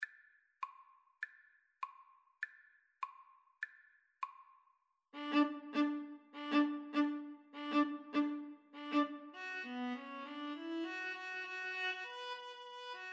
wellerman, Viola-Cello Duet; New Zealand Trad.

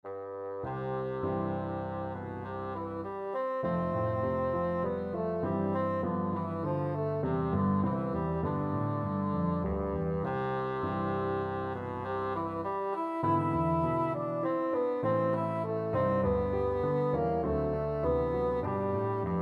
bassoon

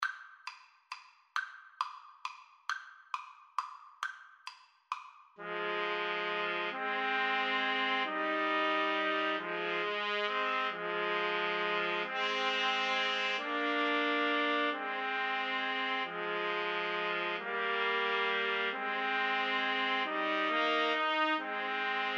scarborough fair, Trumpet Trio

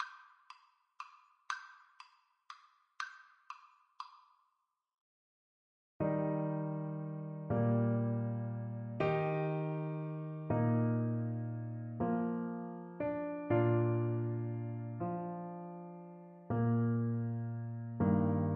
nabucco, Trumpet; verdi

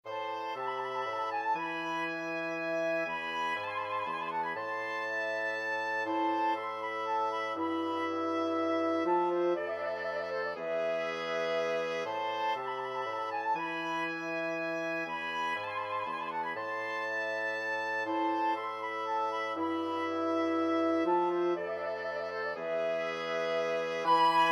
corelli concerto grosso, wind quartet

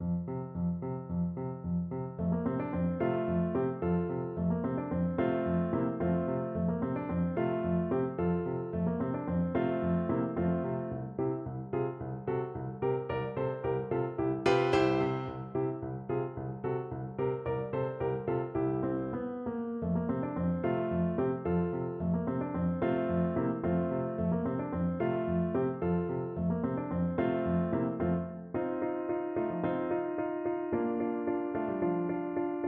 spinnliedchen, piano

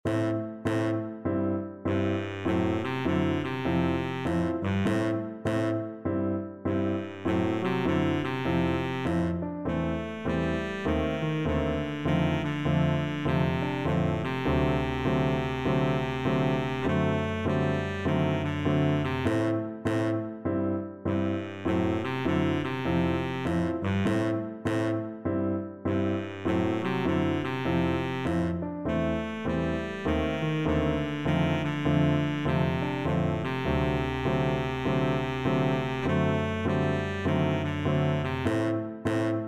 baritone saxophone

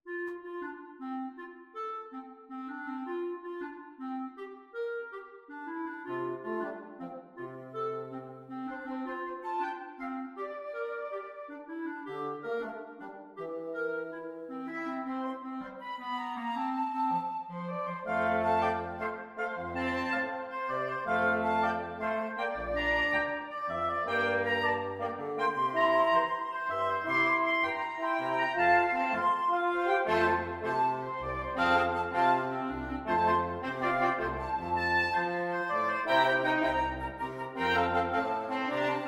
jingle bells salsa, wind quintet

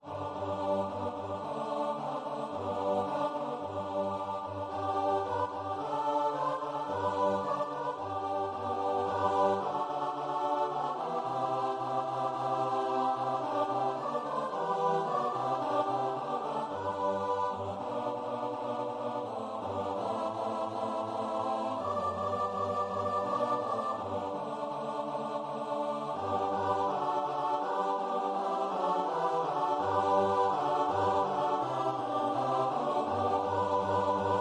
CHOIR

